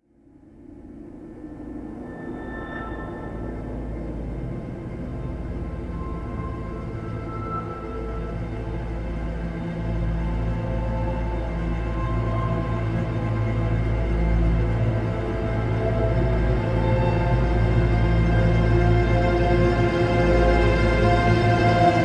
Alternative Ambient; Heroes Glue Screen

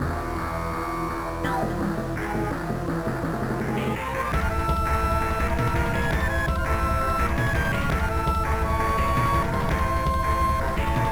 Vizier Battle; prince of persia the sands of time 2003 gba

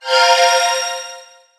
Heal

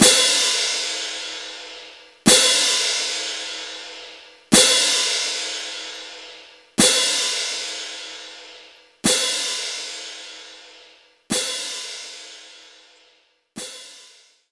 Orchestral Cymbal; Knights Try

